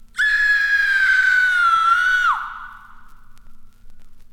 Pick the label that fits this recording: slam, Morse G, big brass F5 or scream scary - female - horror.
scream scary - female - horror